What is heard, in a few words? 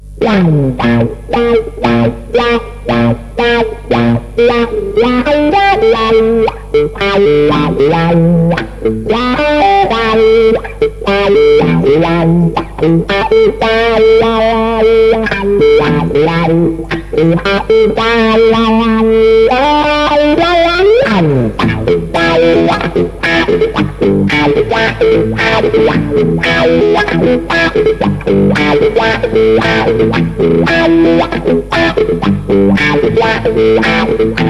wah dist; vox847